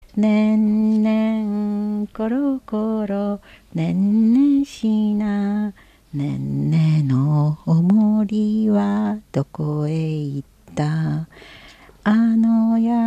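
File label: lullaby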